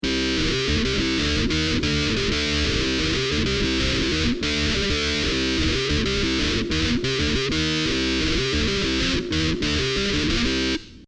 5ths Keys Hi NRG lead

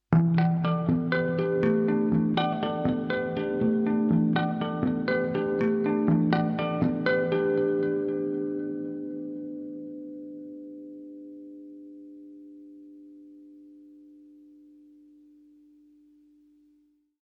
Winter

